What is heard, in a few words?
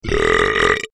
brap; I belched that all out at once, one take, no breaks.